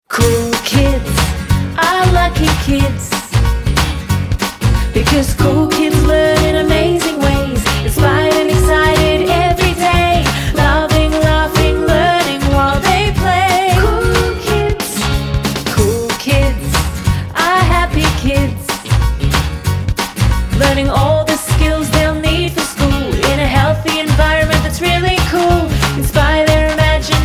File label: jingle; KoolKids